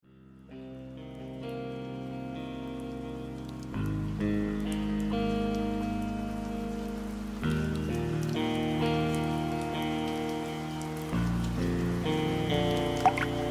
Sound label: sad playlist slowed rain